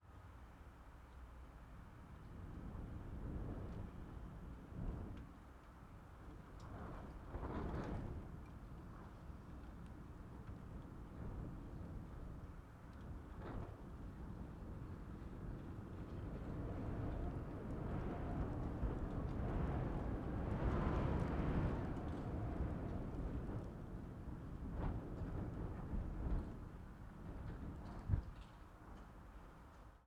Wind, Raindrops, Interior, Open window, Long whoosh; Hzandbits; Howling Winds